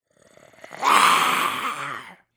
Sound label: Zombie Aggressive Attack; زامبی